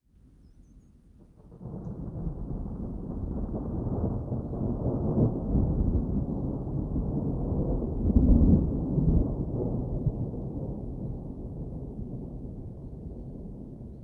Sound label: soundscape mountain forest thunderstorm close birds distant summer; fvtt sonniss gdc audio